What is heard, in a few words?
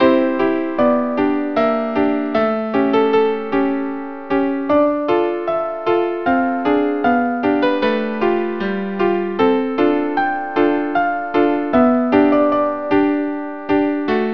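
string quartet; reverie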